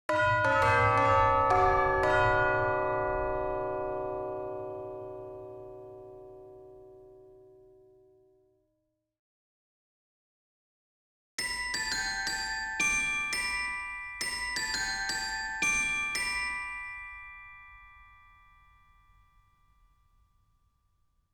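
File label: g major bells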